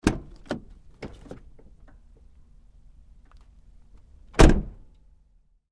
Truck GMC Savana, Door, Open-Close, Med; Vehicles

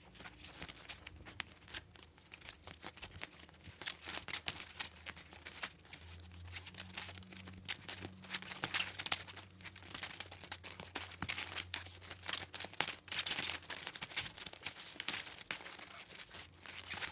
Flag Flapping Small Ribbon, wind; Weather; Big Room Sound

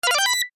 UI Commit, User interface feedback sounds

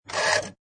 Pinball Sound Motor Or Crane Move; Video Game Sound Effects

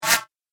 Laser Swish, whoosh; sound design

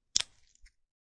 Cracking open of pistachio nut.; Eating; Human

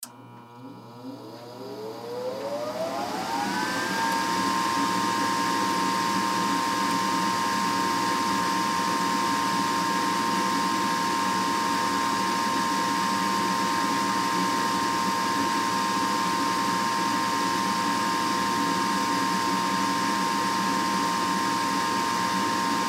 Heater On Run Off; Household; Heater Turn On Run; Furnaces; Blastwave

